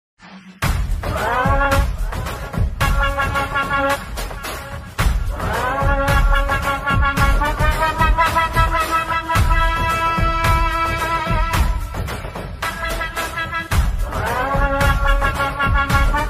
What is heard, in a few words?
bgm ringtone attitude ringtone; jailer